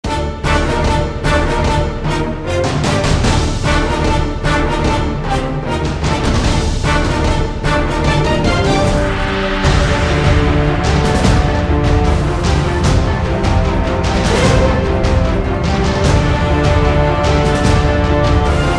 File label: Musical Chairs, orchestral